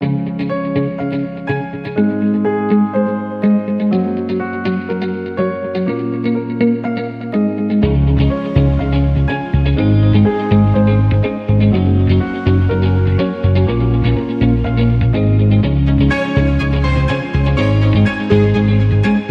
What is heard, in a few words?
Positive Team Motivation, No Drums